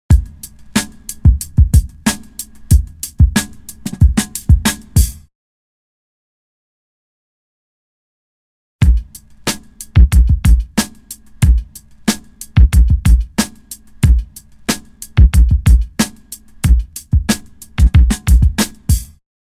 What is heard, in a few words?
Drum loop; BEST OF DRUM BREAKS 30